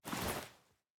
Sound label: Inventory Open